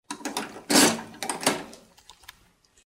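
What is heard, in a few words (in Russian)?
обнуляется рычажки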